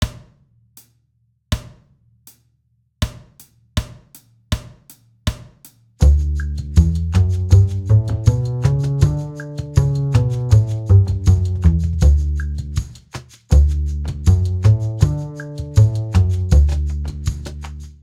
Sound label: Scales Concert D